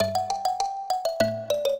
trio, mbira; Mozart

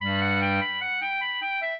clarinet; Mozart

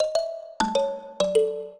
minuet, mbira; Mozart

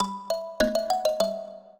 minuet, mbira; Mozart

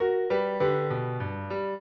trio, piano; Mozart

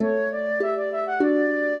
minuet, flute harp; Mozart

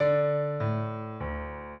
piano; Mozart; minuet

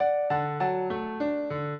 piano; Mozart

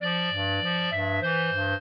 clarinet; Mozart

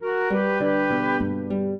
trio, flute harp; Mozart

